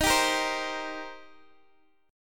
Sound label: D sharp 6th, D sharp, down, Guitar, DADGAD